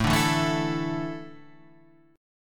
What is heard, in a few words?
A Suspended 4th; Asus4 Chord {5 5 2 2 5 5} strummed